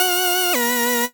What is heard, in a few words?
Beat